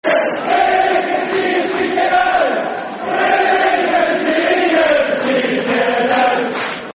We Can See You Sneaking Out, Football Chants (Soccer Songs); Rangers GRFC